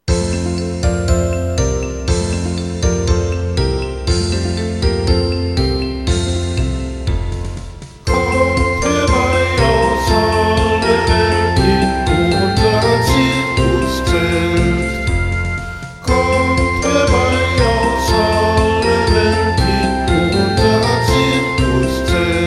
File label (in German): zirkuslieder kommt herbei aus alle welt kanon voc